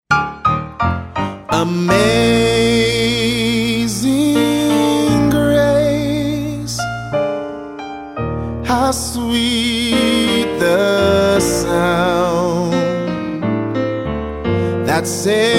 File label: Amazing Grace ring tone